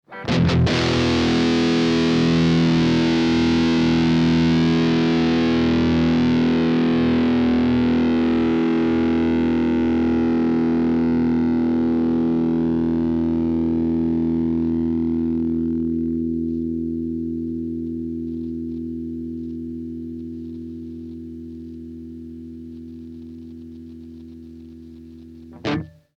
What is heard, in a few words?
mod chord; circuit demos; factory